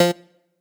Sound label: stab trapt, stabs